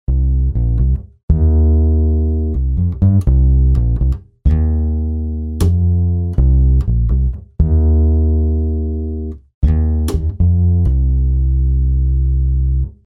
Ac Bass Gtr True Stacc A

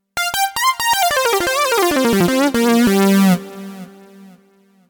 Yamaha Solo

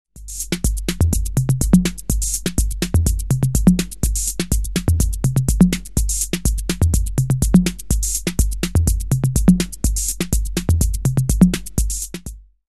TOMS

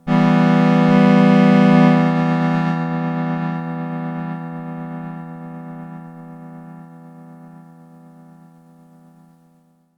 Synth Reverse dynamic Pan; TC Electronic 2290 P

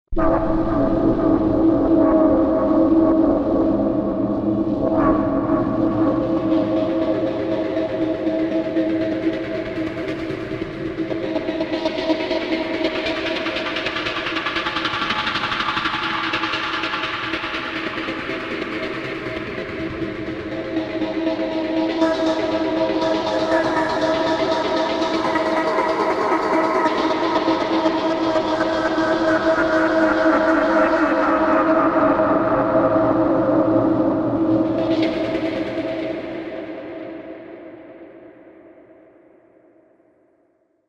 Emergent Hum; Cypher2 TX; 5D; FXpansion

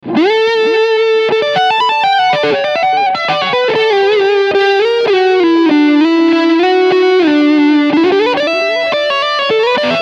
High Gain Delay

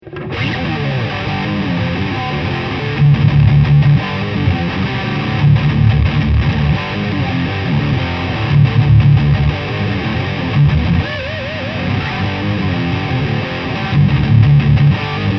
Lead

